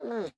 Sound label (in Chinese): 犰狳环境声